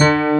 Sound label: D. PIANO E1